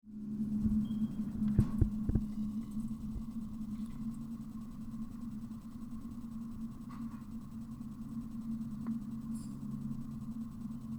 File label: glass vessel